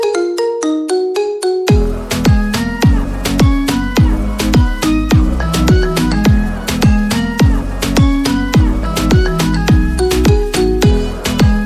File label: Marimba